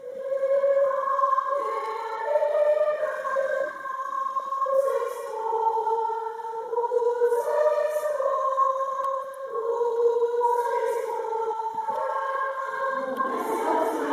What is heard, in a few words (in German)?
Dreistimmiger Kanon; Im arm der Liebe ruth sichs wohl